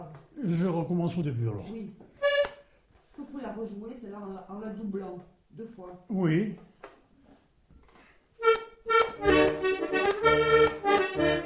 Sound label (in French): bourrée